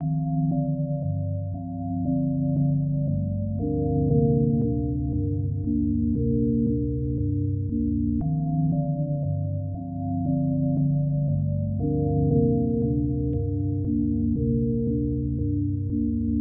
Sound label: Melodic Sounds